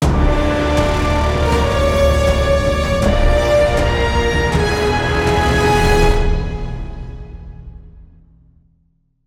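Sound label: Defeat Stinger 80BPM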